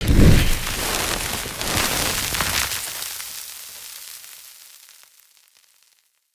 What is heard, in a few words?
Siege Rope Ignite; Encounters